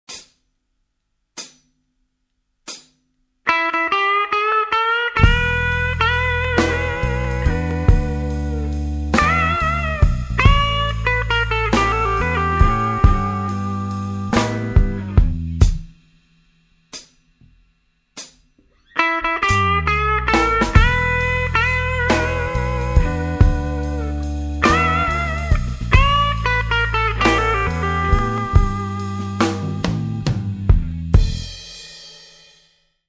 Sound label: blues jazz